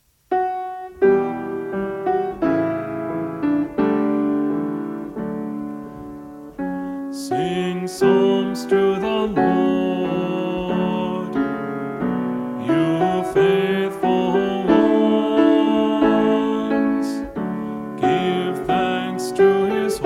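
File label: Melody, Choir Practice Tracks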